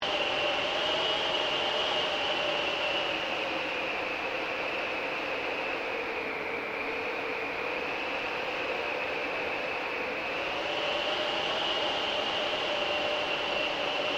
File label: Wind Whistling Loop; Elemental weather effects; Wind (Sound Effects:Weather); SoundEffects-Foundation4